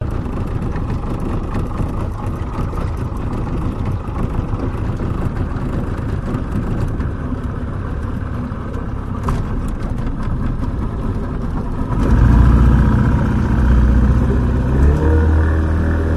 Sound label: outboard motor boats boat fishing outboard idles and drives away; silverplatter audio